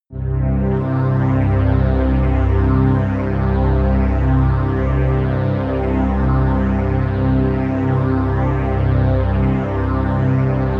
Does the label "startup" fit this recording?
No